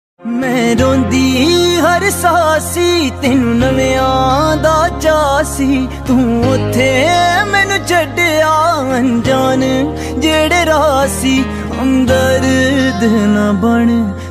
Punjabi Beats Ringtone

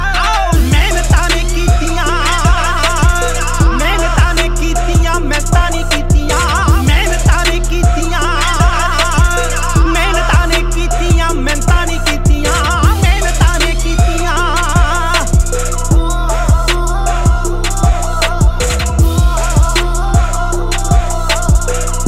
Punjabi Ringtone

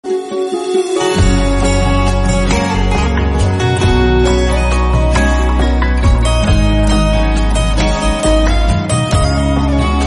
Instrumental Ringtone

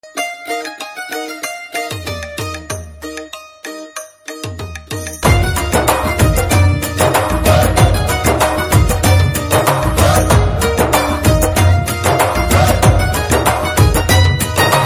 Instrumental Tone Telugu Ringtone; Shailaja Reddy Alludu

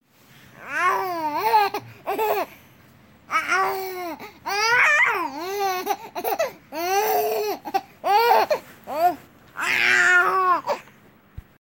Baby Giggles Ringtone

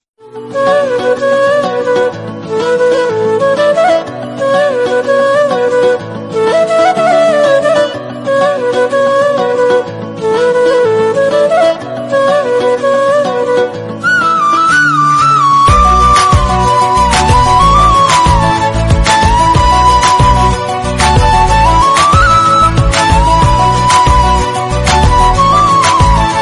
Flute BGM Telugu Ringtone; RX100

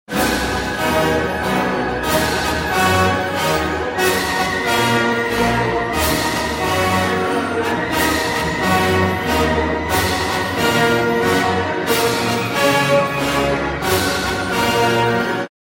Playful Ringtone

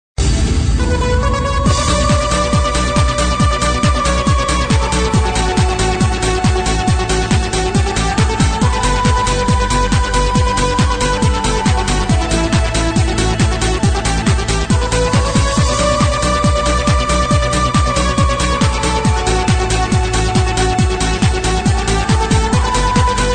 Techno Ringtone; Titanic

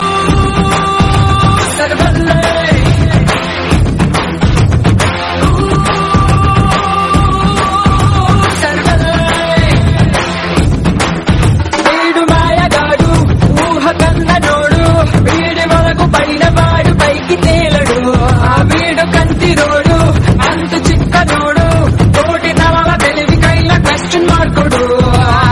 Title BGM Telugu Ringtone; Oosaravelli

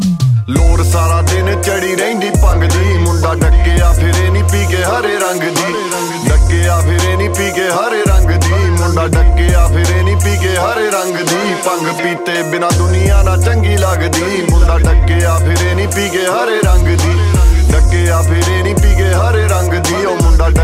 Dance Punjabi Ringtone

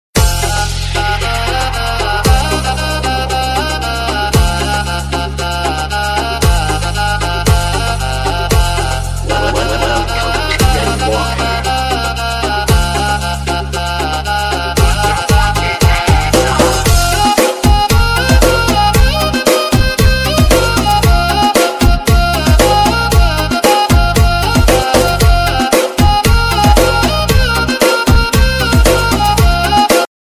Dj Thai Ringtone

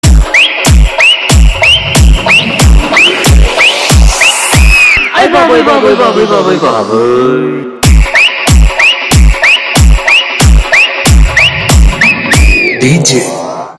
Instrumental Ringtone

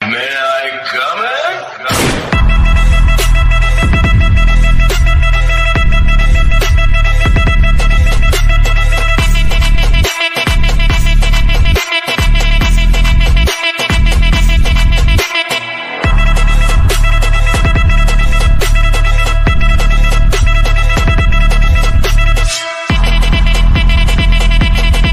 Tamil Ringtone; Kgf

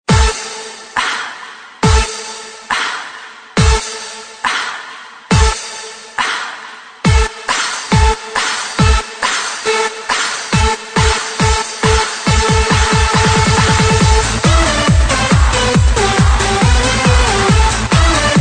Club Energy Ringtone

